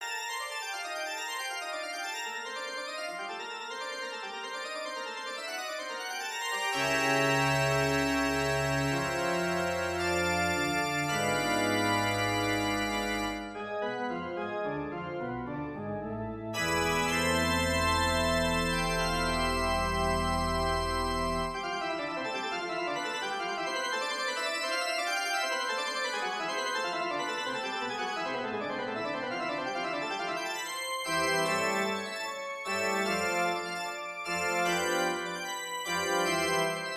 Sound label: toccata and fugue in d minor organ; bwv 565; syntheway organux vsti